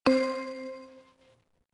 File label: warning; squidGame